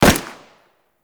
Fire, Rifle; Deth Cube Fire 2D; Warframe; Weapons; Sentinel; Deth Machine Rifle

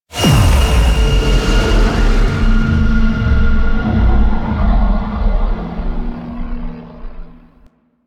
Light Elevator Eject; Warframe; Quests; The New War

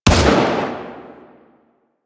Burst Rifle Fire; Grineer

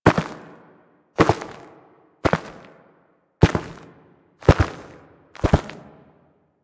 Walk Forward Steps, Forest Night; Warframe; Sacrifice; Ballas

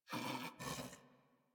Pet Snore; Enemies; Warframe; Catbrow; PetShip

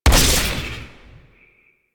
Amp Fire; Weapons; Warframe; Operators; Amplifiers

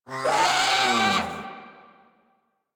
Combat Vocal Death; Enemies; Warframe; Catbrow

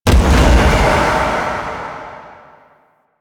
Void Dragon Orb Proj Explosion Poison; Enemies; Abilities; Warframe; Duviri

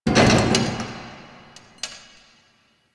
Car Creak; Warframe; Ambience; Nineteen Ninety Nine

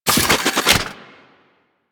Fist Spin; Enemies; DE; Warframe; Tyl Regor

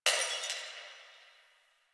Distant Glass Breaking

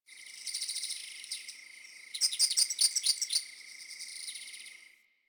Night Insect Generic; Ambience; One Offs; Insects; Warframe; Quests; The New War; Drifter Camp

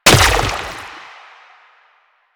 Grenade Bounce; Warframe; Lasrian; Lasrian Soldiers; Weapons; Lasrian Chem Nox

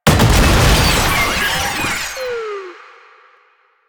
Tank Drone Explosion; Enemies; Abilities; Lasrian; Warframe

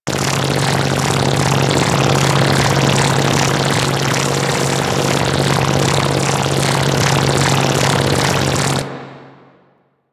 Freeze Ray Laser Loop; Warframe; Weapons; Corpus

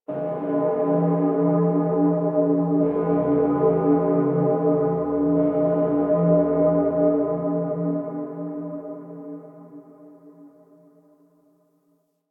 Church Bell Ring Clear; Dagath; Warframe; Ambience; Clan Dojo; Dojo Misc

